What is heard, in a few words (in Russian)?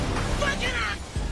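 бух бакана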